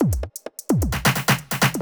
electro kit 130

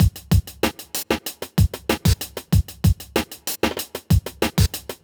kit; Sound Effect Library

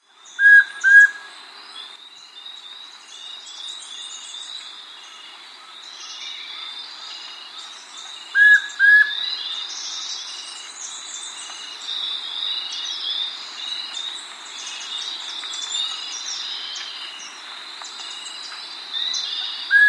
Yellow-Breasted Antpitta, S. America

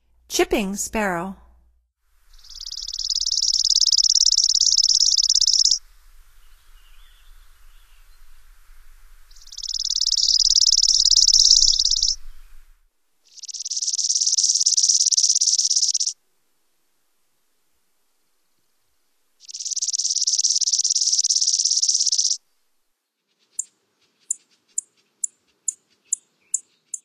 Chipping Sparrow, Songs of Birds of North America; Stokes

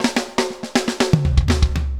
Funk Rock Fill, Drums

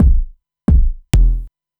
Kick Loop, Dance Beats

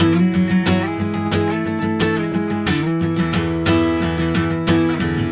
Acoustic Strum, Guitars

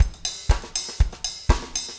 4 on floor 120, loops, 120bpm

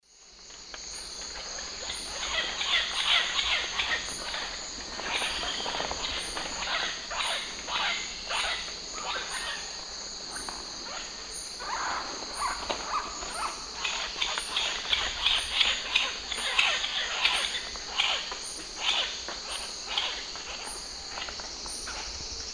Celeus elegans, group calls; S. America; Eastern Lowlands

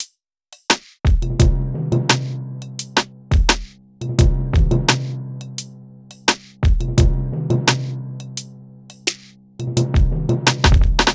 Eight Vibes, Hip-Hop

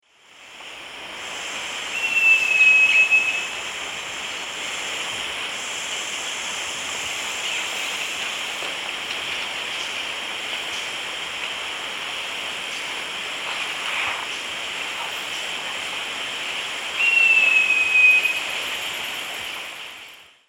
Jacamerops aureus, song; S. America; Eastern Lowlands

